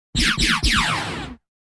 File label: Synth Energy Zaps